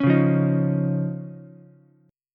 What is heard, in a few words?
C sharp Major Add 9th C sharp, Ukulele; strummed; chord; 6,1,1,6; JGuitar